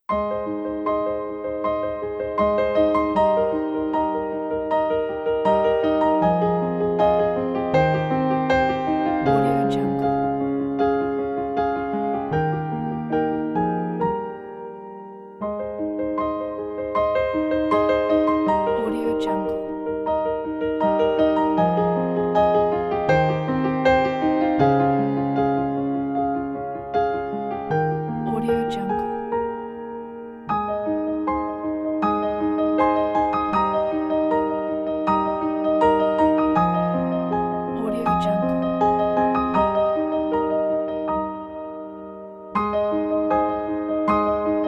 emotional

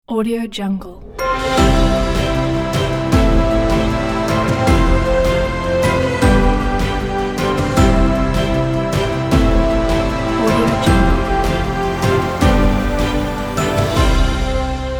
inspiring logo music

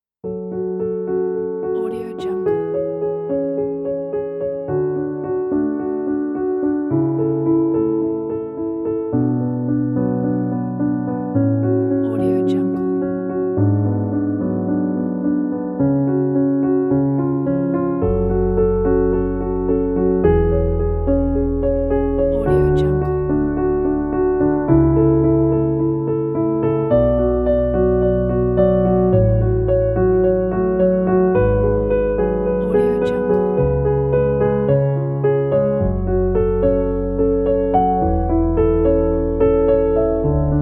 piano sadness music

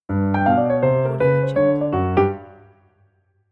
piano jazz logo music

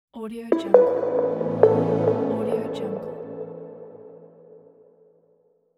inspiring hi tech logo music

